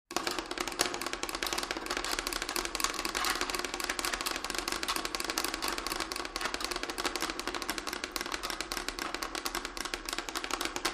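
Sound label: Running Beetles Eerie, insects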